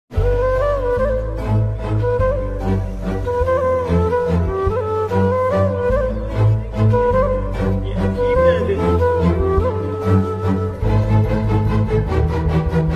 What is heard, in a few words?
movie bgm heart touching bgm